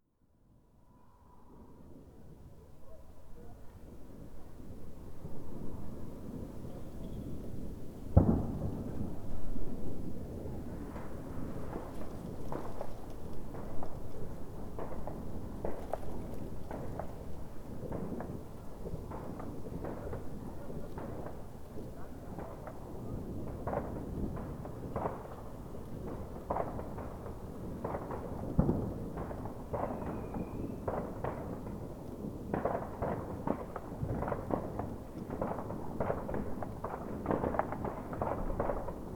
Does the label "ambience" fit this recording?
Yes